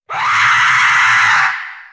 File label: screaming